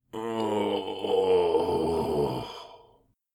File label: Elderly man fatigue moan